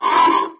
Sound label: travel exclamation; windows 9x; sound schemes